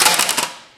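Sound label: physics clipboard impact medium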